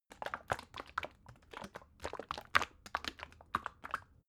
bottle syrup movement; berufe

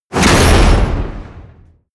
wham, whooshes

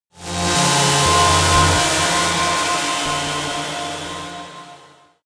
sci-fi cosmic dark energy disturbance; horror

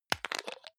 hygiene small screw cup open hard, badezimmer

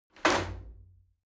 shower slide door open hit regular

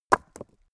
stone hit stone; natur-tiere; steine

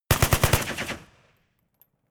rifle ak-47 exterior fire burst reflection; Firearms; schusswaffen

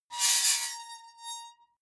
metal squeal bright fast; metall

